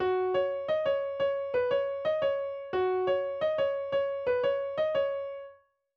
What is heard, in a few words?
break